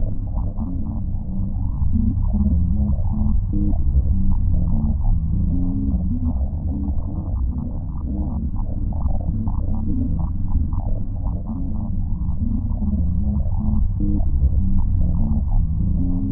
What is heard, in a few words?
Bleep Loop; Insanely Twisted Shadow Planet